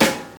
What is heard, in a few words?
classic hip hop acoustic snare sample f key, snare drum sound tuned to the F note